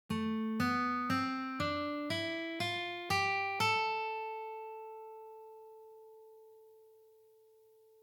2nd Octave

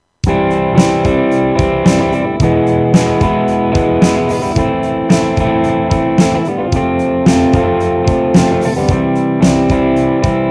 The Ultimate Strum Pattern; Strumming 101

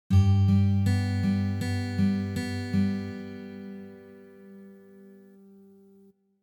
Drone Notes

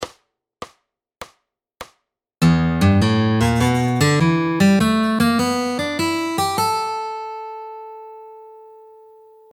Fingerstyle Blues Rhythm And Lead

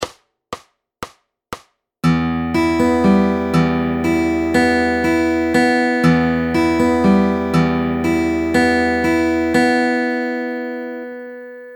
Fingerstyle; Getting Started With Fingerstyle

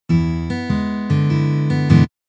Blues Guitar Fingerpicking Pattern E7

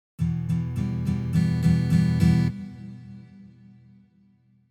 Fill Normal

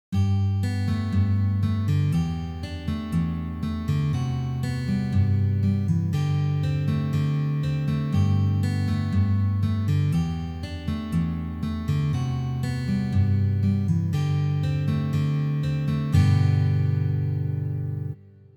In Focus Ex. Complex picked

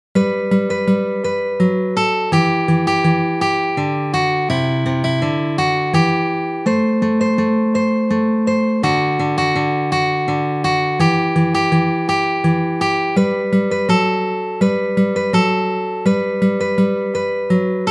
Melodic Fingerpicking

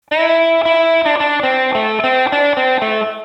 Lick

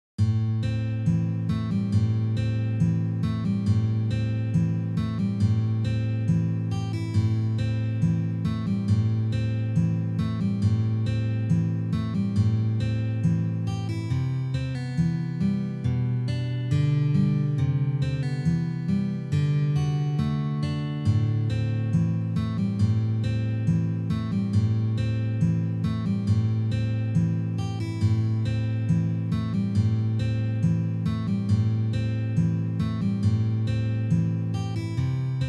Pull Off

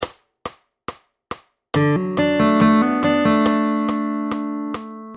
Fingerstyle D Chord Hammering; Fingerstyle Fun; P3 Amazing D Chord

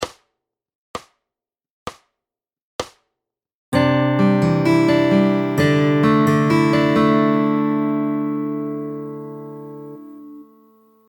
Fingerstyle Chord Anchors

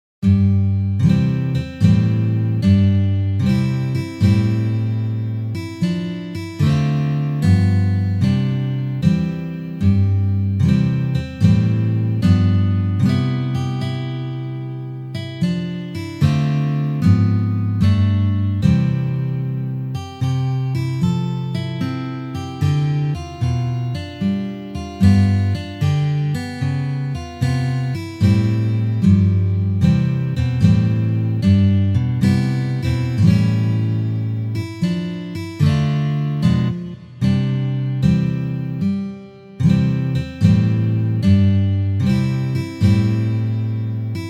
O Christmas Tree Hard Normal

